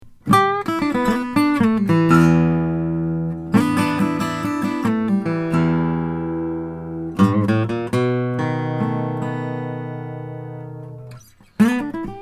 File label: bown om ziricote